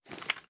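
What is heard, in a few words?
pistol raise first up; gmod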